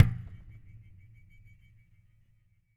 Hit Flick

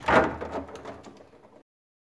Fridge Door Opening

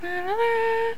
Lalala

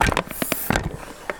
Robot Step

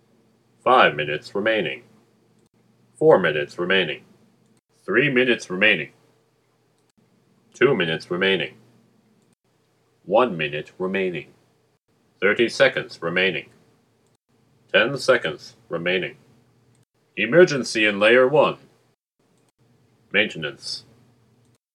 Emergency Countdown

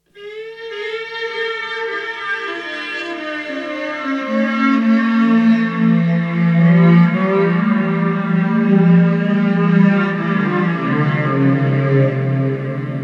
Psycho Viola

